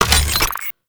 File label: Oil Grenade Ready; Vehicles; Abilities; Warframe; Nechro Mech